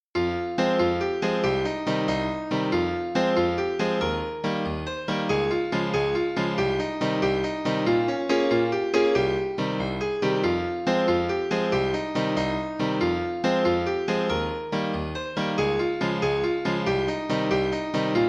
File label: F minor jig